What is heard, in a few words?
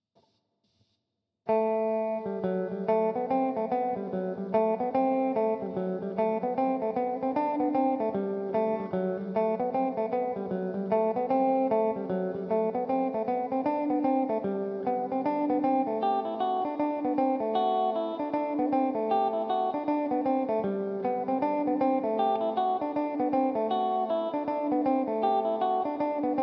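reel G major, tenorcaster; Eastwood Tenorcaster electric tenor guitar (with synthesised double bass accompaniment); The Moon On A Stick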